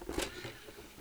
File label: disc unclamp, BDR-S11J-BK